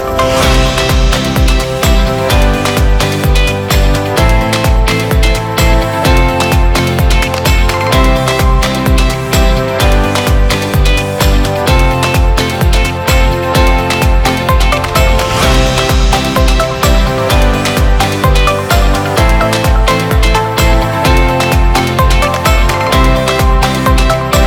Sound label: inspiring sound